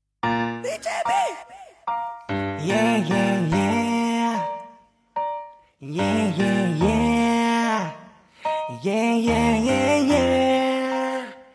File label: intromusic; ff2